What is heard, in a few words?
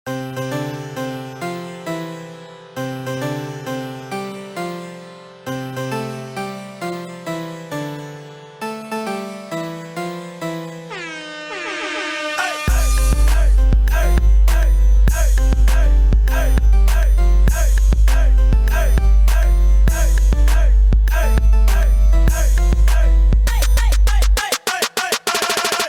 Birthday Song in Telugu